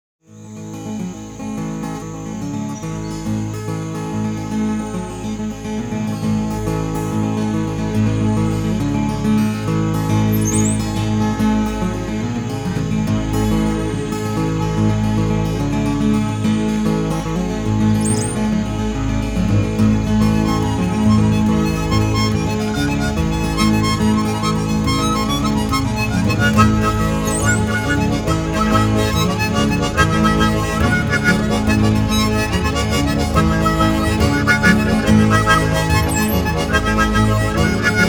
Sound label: Guitar Harp